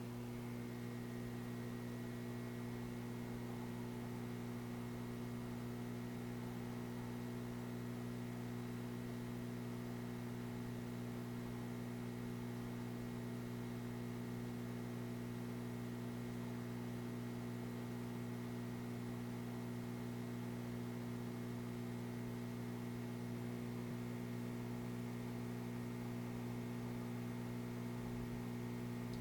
Fluorescent Hum; Welcome to the Game II

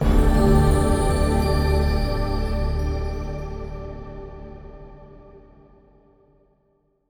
Stingers sting gain huge; Hand of Fate 2

